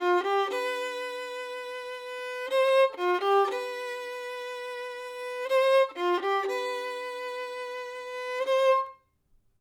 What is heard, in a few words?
violin